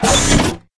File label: robotics rocket turret mech reload; smite; vulcan